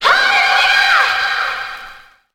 high chow, scream; ooh ahh

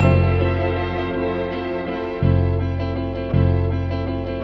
guitar

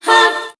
"huh" sung, voice; ooh ahh

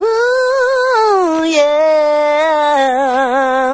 "ooh yeah" SUNG

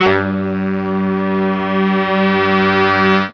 blaster, Horns; Notes

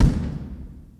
distant, Kicks; Medium

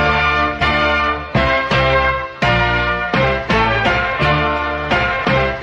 horns, Loops, Phonky

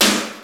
beefy, Snares Electro

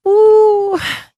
"ooh" SUNG

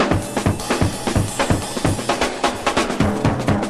really more of a fill, funky drum loop; Drum loops

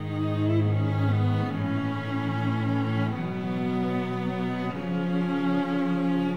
strings, sad loop

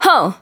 "ho" female, voice

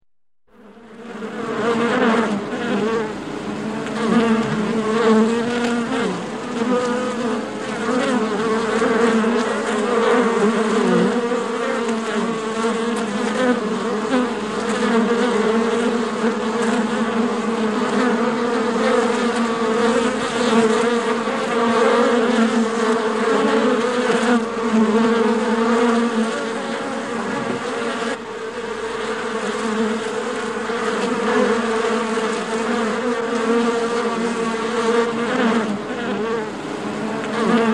bee hive; Animals

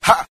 "ha", Voice

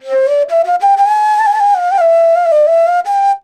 flute; Phonky

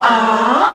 ah group of men

